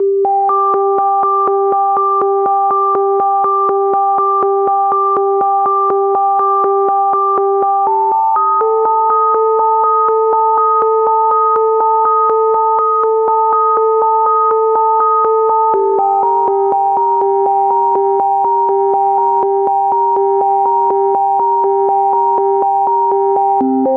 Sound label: 122 BPM Melodic Arps G maj F min Tonic F; MMB